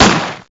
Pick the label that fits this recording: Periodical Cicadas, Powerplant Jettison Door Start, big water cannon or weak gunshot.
weak gunshot